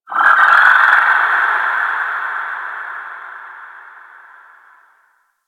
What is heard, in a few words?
Ocean Underwater Creatures Low Medium; Ambience; Warframe; Grineer Ocean